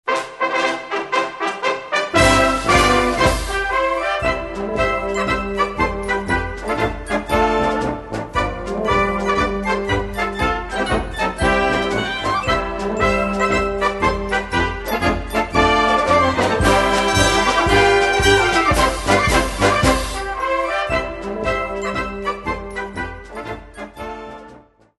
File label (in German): musikmusik